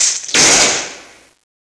electro 4, weapons; cstrike

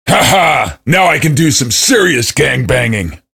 cheer; svencoop; voice commands; SeriousSam

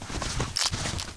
bandolier, items

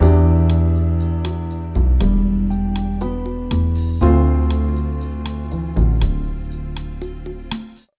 jazz

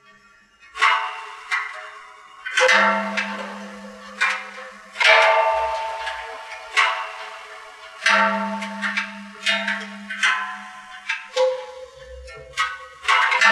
cooling metal; svencoop

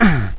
fall pain, player; firearms

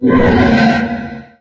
controller idle; svencoop

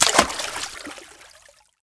slosh; dod; Splasheffectsounds

